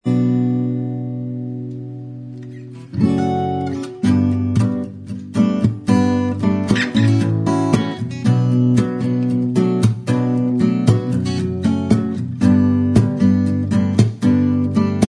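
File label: acoustic version instrumental, backing tracks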